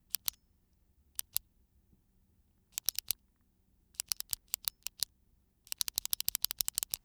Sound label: pen click